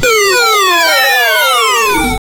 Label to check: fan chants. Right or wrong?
Wrong